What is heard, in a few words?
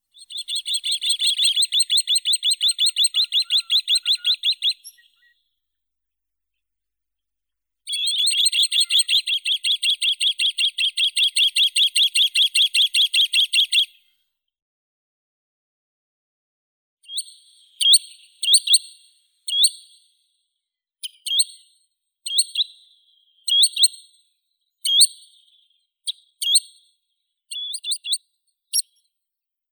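green sandpiper, tringa ochropus; chernish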